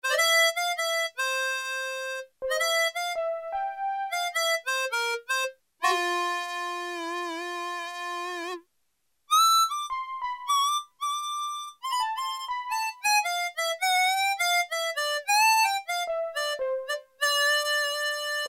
VELOMONICA, Casio VZ-10M; Internal factory combi

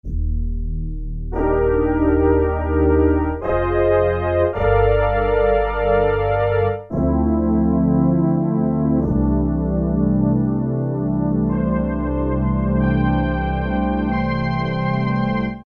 BRASS CHORUS, Casio VZ-10M; Internal factory combi

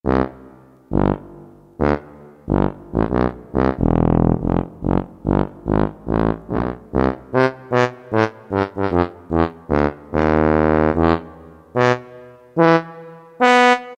TUBA; Roland SN-U110-06

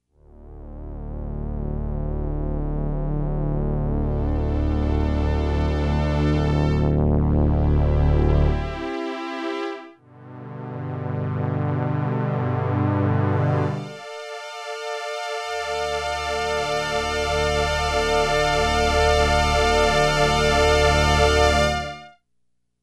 Slower Strings

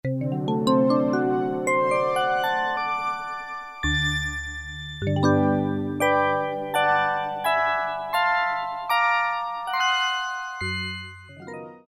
FOOT VIBIANO, Casio VZ-10M; Internal factory combi

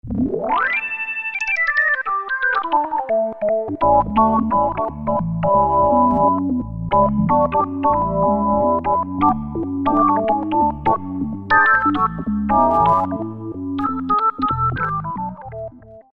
Organ 1, Roland Alpha Juno-2; Factory A

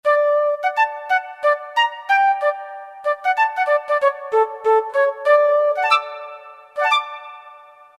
Flute 2; Roland MT-32

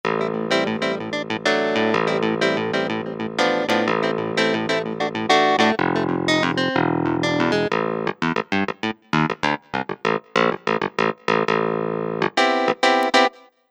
Mod Whl Clav

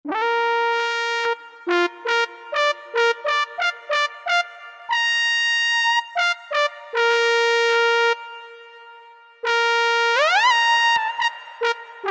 V-trumpeter, Roland V-Synth; Factory Patches

